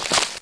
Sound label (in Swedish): skog; skogsljud; aomdc